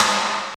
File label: SNR, Tekno Industrial; East West